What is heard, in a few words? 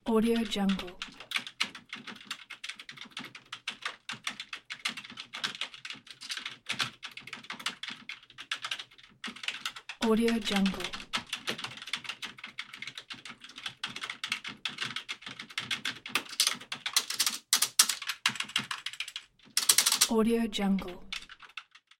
typing; crisstyna